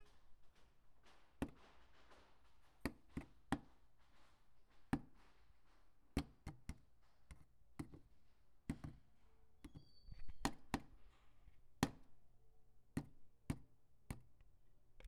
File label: Tapping Microwave; West Hall Tech Dump